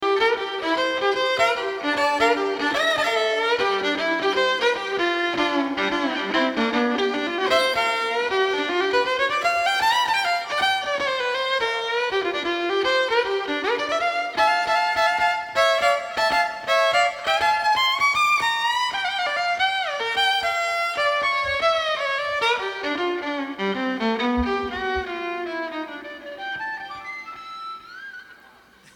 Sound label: Fiddle Intro